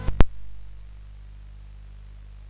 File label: sid CSG8580R5; Gryzor; hvsc